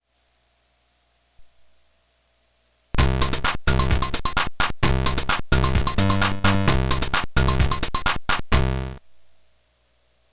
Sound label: gba sp light on